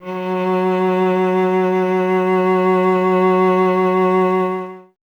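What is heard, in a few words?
strings cello F#; Miroslav Vitous; Solo Instruments VOL-1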